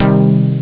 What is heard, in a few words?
jump; twintris; ST-XX Amiga Soundtracker Sample Packs